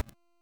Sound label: Sniper Reload; DE; Warframe; Frumentarius; Exalted Sniper